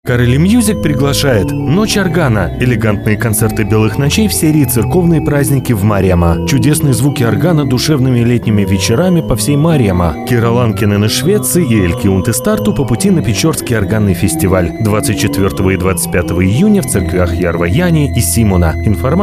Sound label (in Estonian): orel; corelli